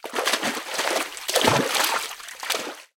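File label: wave running onto the shore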